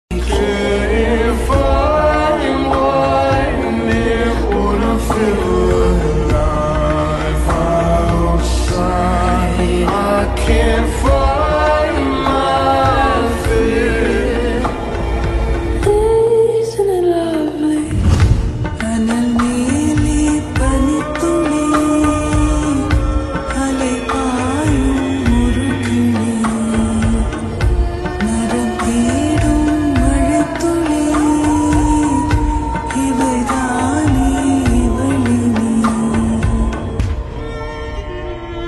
ringtone lofi remix ringtone slowed reverb ringtone